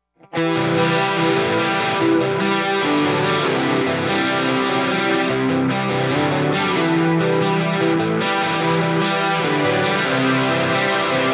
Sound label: synthwave